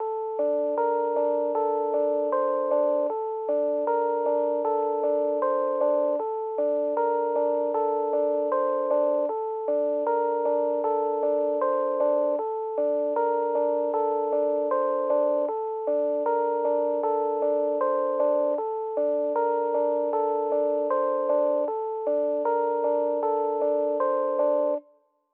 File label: 155 BPM G Min Rhodes; Cymatics; Utopia; Houston